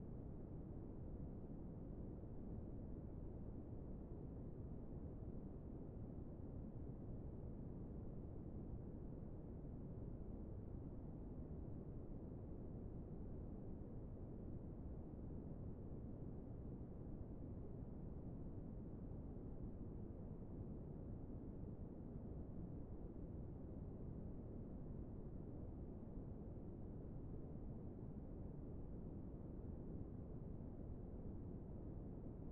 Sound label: room tone